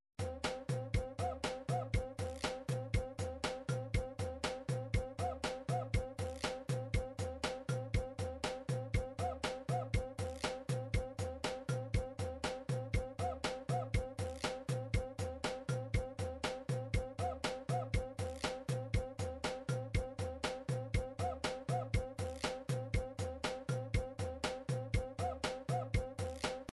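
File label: drums pattern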